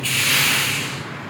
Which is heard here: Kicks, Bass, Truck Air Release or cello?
Truck Air Release